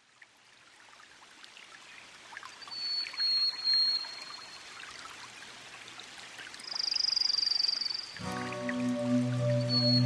mountain sunrise pine forest; pine forest repose

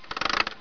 household

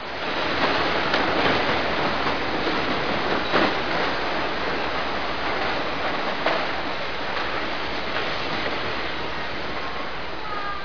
background

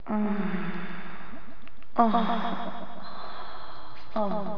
comic

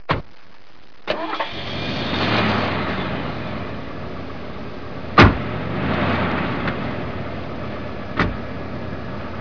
trucks

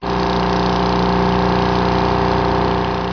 electronics

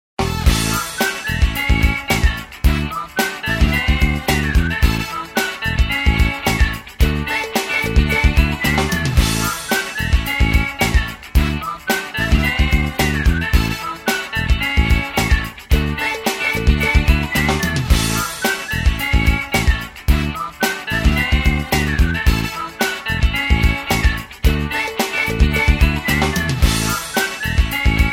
ringtone music

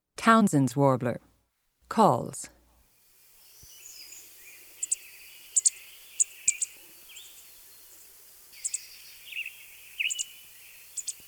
townsends warbler

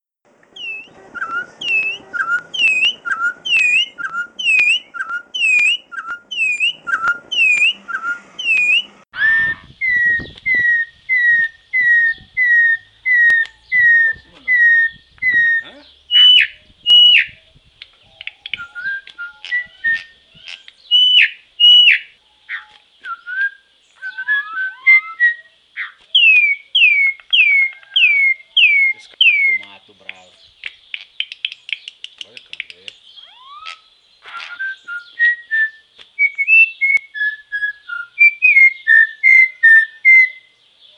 turpial

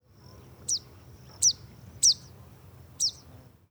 cistensa

